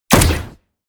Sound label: rifle assault rifle fire; comedy-cartoon; Pistols and guns; pistolen-gewehre